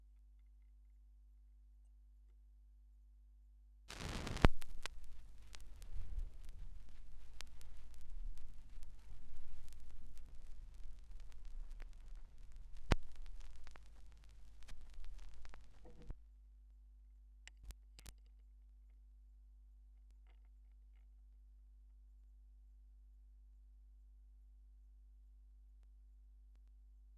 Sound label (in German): schallplattengeraeusche